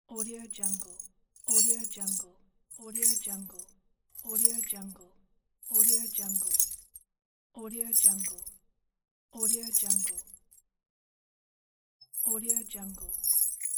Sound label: chain